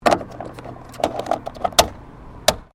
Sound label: Car Ford Focus Fuel Cap Close; Ford Focus hire car; Cars; Vehicles